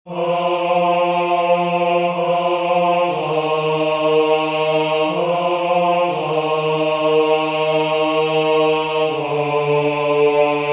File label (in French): Basse, chorale; Graduale